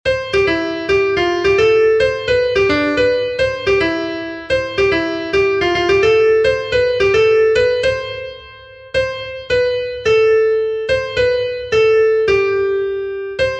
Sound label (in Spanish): SOPRANO, himnos en piano; cantos del camino